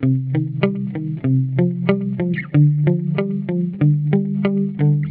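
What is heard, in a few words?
riff g maj 94bpm processed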